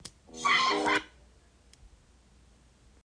high pitch

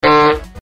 trumpet horn sound

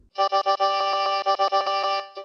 aaaaa aaaaaa

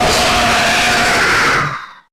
jumpscare sound; fnaf plushies 2 v4

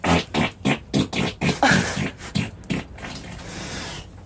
fart

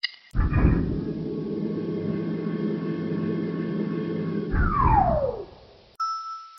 elevator; mgs

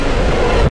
jumpscare sound

